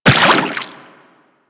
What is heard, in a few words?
Run Water, Footsteps; Warframe; Teshin